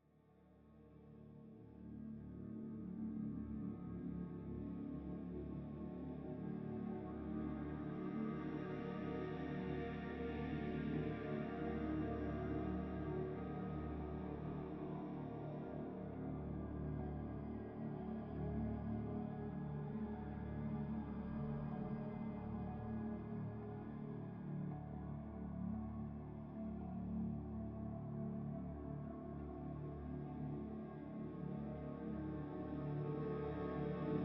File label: Forest; Forest 2